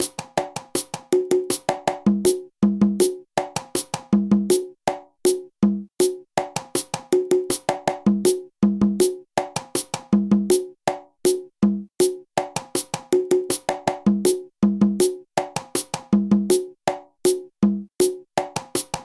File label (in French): batanga conga lent